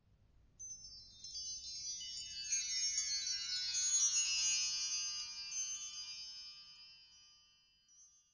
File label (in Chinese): 粒子音